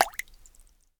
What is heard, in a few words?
drip, water; World Of Goo